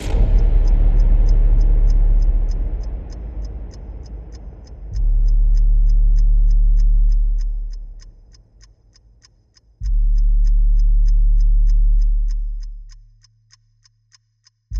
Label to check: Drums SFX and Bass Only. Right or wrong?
Right